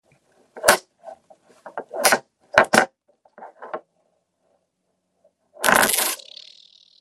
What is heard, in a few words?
buzzing magnets